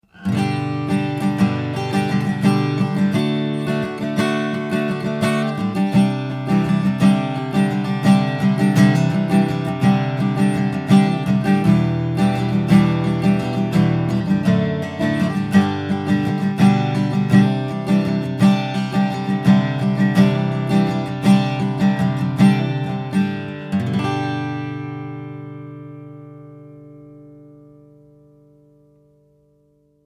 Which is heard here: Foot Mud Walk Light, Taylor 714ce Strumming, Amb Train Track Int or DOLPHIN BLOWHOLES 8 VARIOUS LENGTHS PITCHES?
Taylor 714ce Strumming